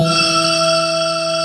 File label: ATMO STRANGE; POP BRS